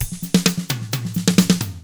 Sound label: HRDRK, hard rock drums; ILIO; Double Platinum Drums 1